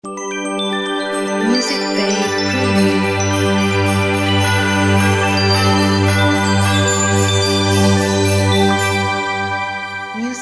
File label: Bright Christmas Logo